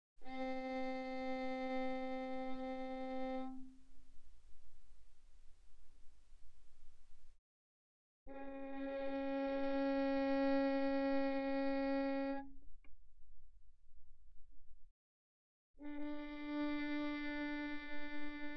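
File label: Violin arco pianissimo sul G C4 B4; Strings; violin2012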